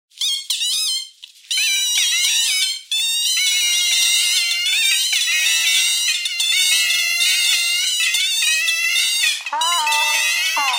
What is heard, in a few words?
bagpipe radio bagpipe radio thread noise